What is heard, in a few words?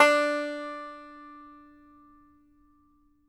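banjo D4 open, banjo notes; Sampleheads - Whole Lotta Country 1 & 2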